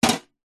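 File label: industrial wrench adjustable plastic handle set down metal tool box; zapsplat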